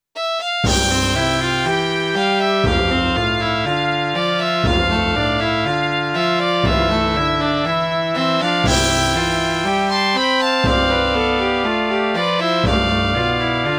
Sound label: World Map (Synthesizer Version); Super Famicom Magazine Vol.10